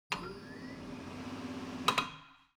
construction garage tire changing machine turning platform; latch